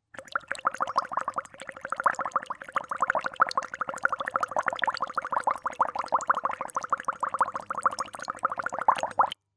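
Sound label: sound of bubbling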